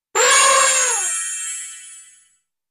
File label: Short Elephant Trum; elephants